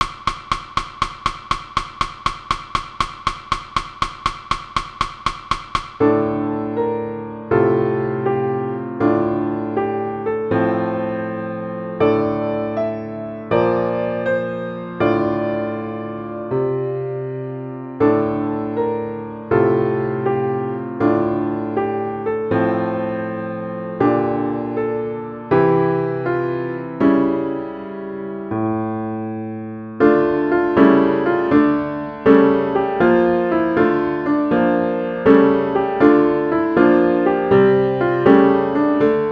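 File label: Piano; RH+LH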